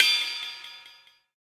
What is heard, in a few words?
Crit Sweetener